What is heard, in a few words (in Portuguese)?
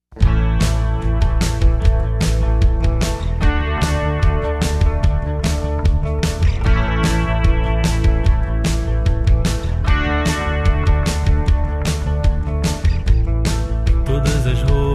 bossanovissima